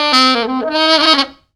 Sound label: FUNKY SAX; Zero-G; Funky Elements